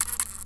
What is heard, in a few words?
camera point shoot